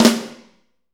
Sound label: SNR, Roland; Northstar - Drumscapes Roland